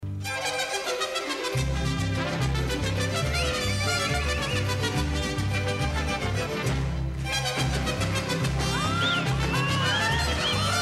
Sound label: Jarabe